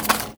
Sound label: Foley